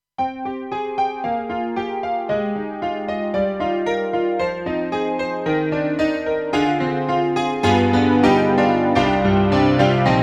Brite Piano; KORG 03RW

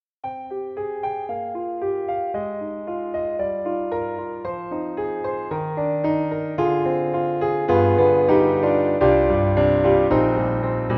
Mini Grand Closed Lid; AIR Music Technology

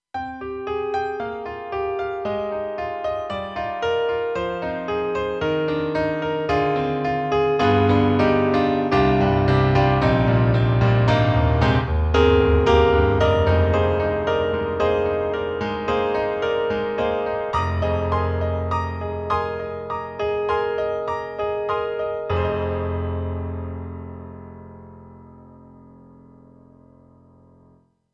KORG M3 XPanded Acoustic Piano

